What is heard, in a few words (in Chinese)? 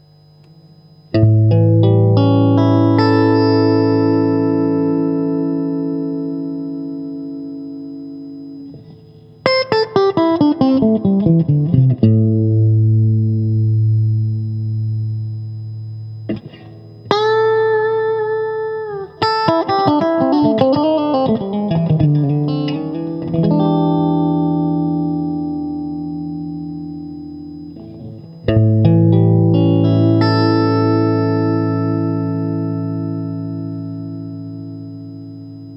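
60S MWS 美产铜线 清音 3档, acoustic guitar; kindman 60s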